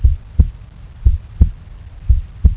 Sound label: HEART